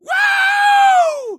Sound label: woo